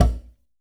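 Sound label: FX CONGA LCL; AKAI S-Series CD-ROM Sound Library VOL-5; FX PERC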